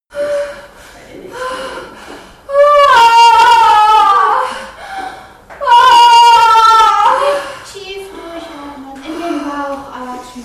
birth mother moaning

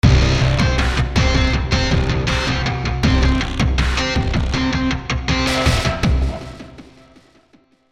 cool electro riff

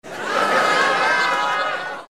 laugh with yeah

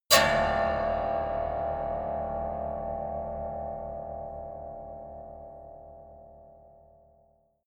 piano squeal

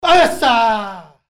karate punch with vocal

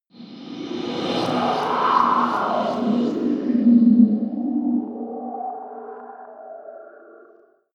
haunted house

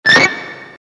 guitar cut

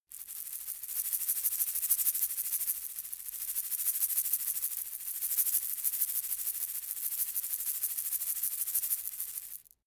shaker plastic egg rattle soft

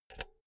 insert cinch

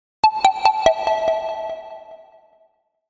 cold synth

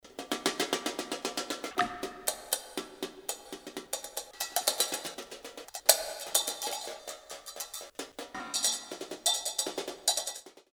rhythm chinese

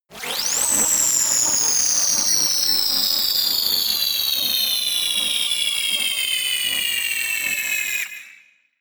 glitchy space drop

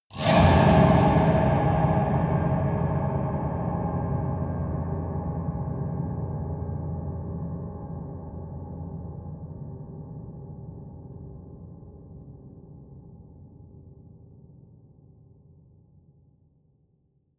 piano twang multiple fast long

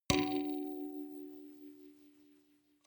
notification kalimba rattle body hit

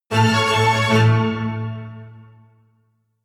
jingle orchestra strings fast

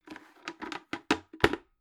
bucket handle use pop

